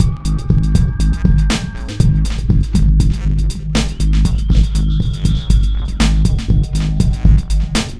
120 Eerie Dub Full, Drum Loops; 120bpm; Nu Dance Electro

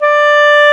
clarinet D 5; East West; Global Sounds

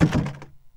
BOX RATTL; Ambient Sounds; Box Noises; E-MU Producer Series Vol. 3; Hollywood Sound Effects

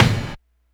snare, Hardcore Breakbeat; Big Fish Audio - Hardcore Breakbeat Action; Disconnected

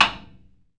Surdo rimshot; E-MU Producer Series Vol. 2; More Studio Essentials; Composer

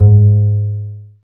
STR, STRINGS; Roland - LA Composer vol. 1

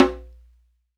DJMB CL, DJAMBE, Africa

